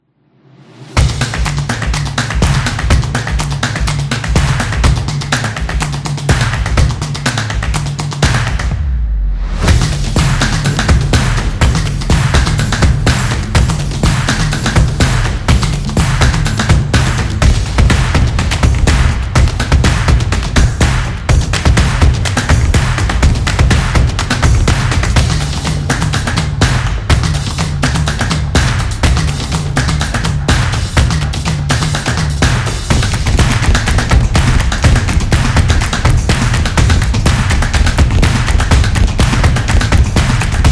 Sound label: Fun Marketing, soccer drums